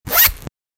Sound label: opening a zip